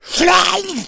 smoker spot prey; voice, idle

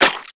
flesh, footsteps infected walk; player

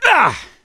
melee swing; survivor; gambler

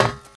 metal, footsteps infected walk; player

